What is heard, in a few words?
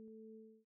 portal